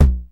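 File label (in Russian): соло на барабанах глухой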